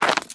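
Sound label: female snow, footstep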